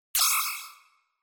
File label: Accent